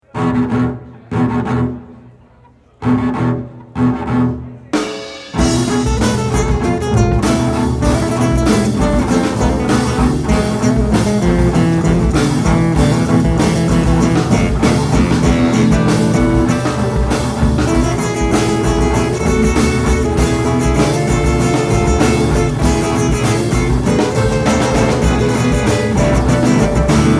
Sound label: Russian lullabye